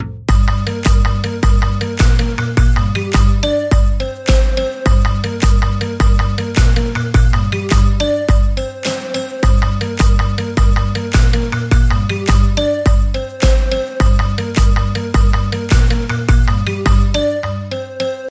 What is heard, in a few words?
Dance Music